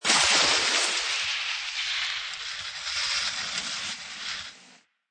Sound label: Skiing Snowboarding Jump Slide And Stop Distant; Skiing And Snowboarding Sound Effects